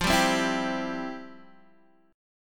F Major 7th Suspended 2nd F, Guitar DADGAD

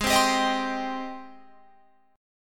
Ab Major 7th Ab down Guitar Open F; AbM7 strummed

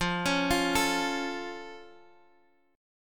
F Augmented F down Guitar Half Step Down

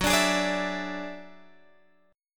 Gb 7th Suspended 2nd Sharp 5th, Guitar, Drop D

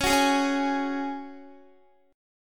Db Suspended 4th Db, Guitar; strummed; x,16,16,13,13,15

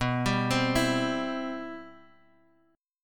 C Major 7th, B, guitar chord

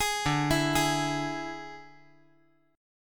D Suspended 2nd Flat 5th D

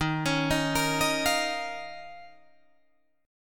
Eb 7th Suspended 2nd Eb, Guitar Drop D

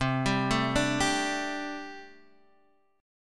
D Suspended 4th C, guitar; Dsus/C; arpeggiated

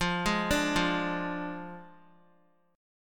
F Minor 6th F down Guitar; arpeggiated; tuning 4E,4A,5D,5G,5C,6F

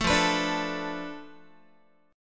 F 6th Flat 5th F down Guitar, chord; F6b5 strummed

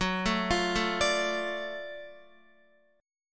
F sharp Minor Major 7th Sharp 5th, Guitar; F#mM7#5; arpeggiated; x,9,9,10,9,10; 4F,4A,5C,5G,5C,6E

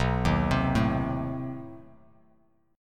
B 7th Flat 9th B down, Bass Standard; B7b9 arpeggiated; JGuitar

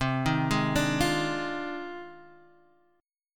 F Minor 13th, guitar chord

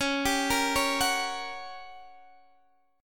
G Minor Major 7th Flat 5th, Db

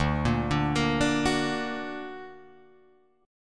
D Augmented D down Guitar Drop D

